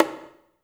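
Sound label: high bongo, left; Spectrasonics; Liquid Grooves 1 & 2; CONGAS+BGOS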